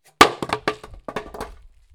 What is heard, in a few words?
Piece of wood